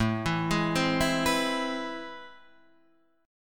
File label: A Minor Major 9th, down, Guitar, Half Step Down